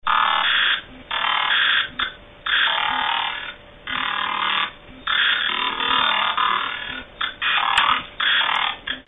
UAA1003 Takt halbiert

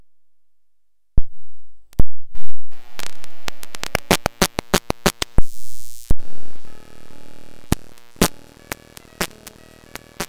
keyboard brummt, Spielzeugkeyboard; Kinder-Keyboard

